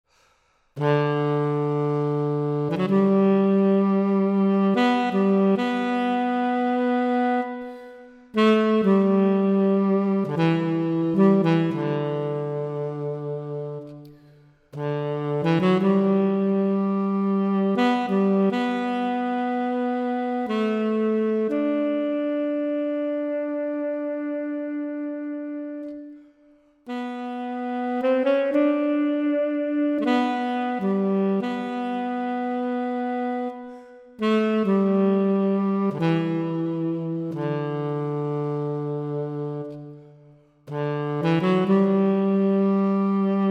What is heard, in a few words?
amazing grace tenor sax solo; hymn stories